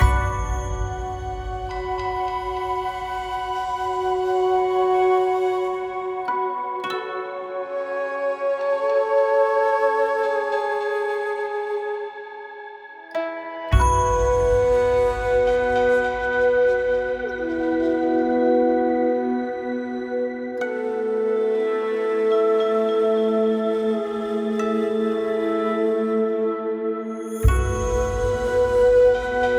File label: music area loop